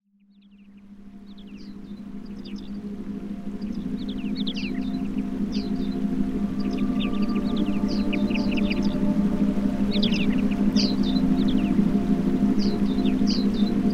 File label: Love Kindness Meditation with Forest Sounds